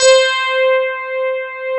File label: FM ELP C4 L, Keyboards Old School; USB Soundscan vol.09; AKAI; FM ELP 6